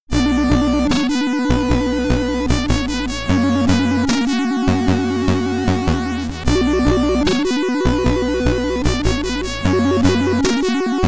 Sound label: Name Entry (SID Stereo), Commodore 64; vikings